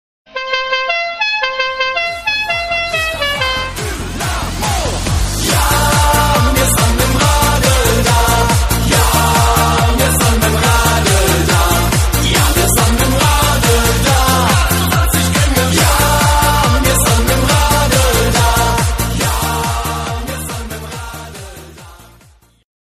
hymne

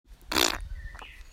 feuchter furz

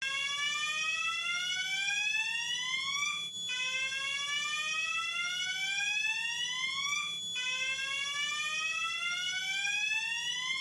brandalarm

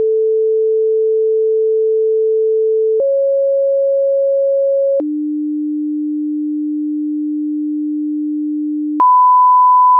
bahnhofsszene

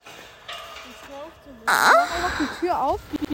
stohnen

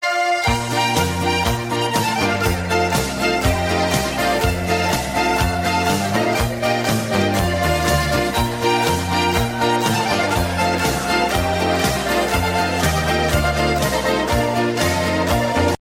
alpenmusik

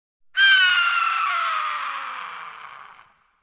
vogel

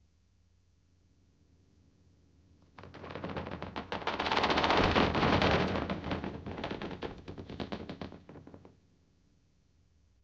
kratzen

